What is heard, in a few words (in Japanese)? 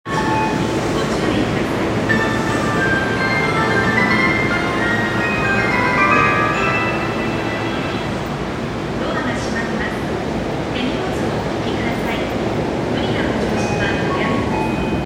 千代田線大手町西, train sounds